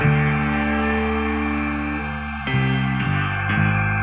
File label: piano, Sad